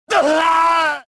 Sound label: death; Star Wars Jedi Knight II Jedi Outcast; XBOX; bartender